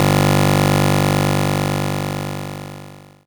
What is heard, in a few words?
GB Bass G; CHIPSHOP HITS